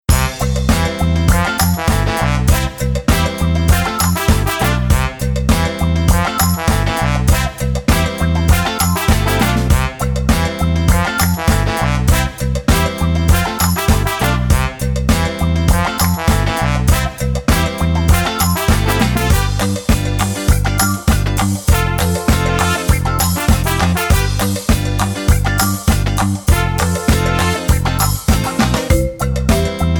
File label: Brass Tacks; Noisebiscuits